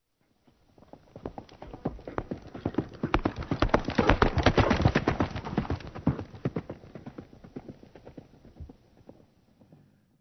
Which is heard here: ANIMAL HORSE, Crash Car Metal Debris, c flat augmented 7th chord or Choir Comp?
ANIMAL HORSE